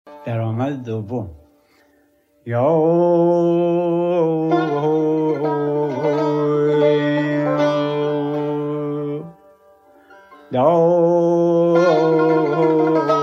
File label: daramad dovvom, shour